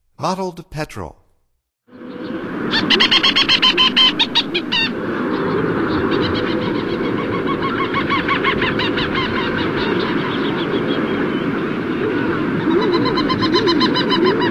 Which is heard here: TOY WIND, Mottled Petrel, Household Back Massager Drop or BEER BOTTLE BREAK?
Mottled Petrel